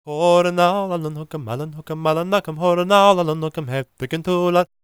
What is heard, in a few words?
GAELIC, World Voices; ILIO; Vocal Planet